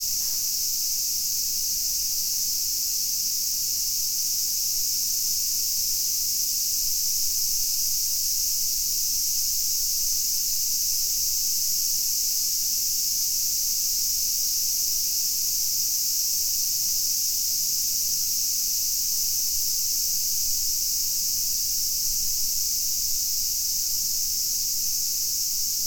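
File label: cicada, Fukushima University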